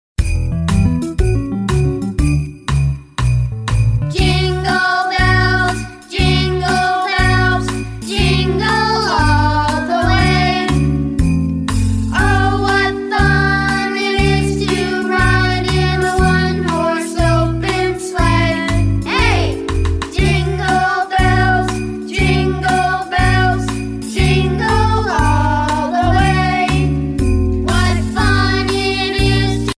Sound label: jingle bells